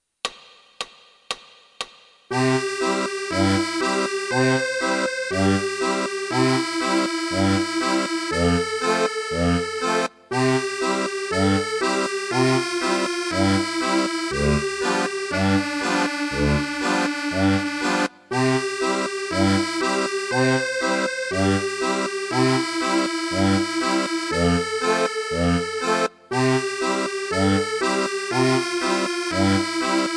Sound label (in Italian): Sul ponte di Bassano, Fisa ascolto 1 voce